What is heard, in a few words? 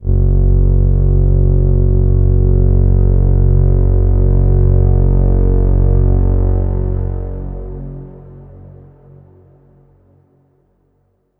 Overlay Drone; Act1 Anchor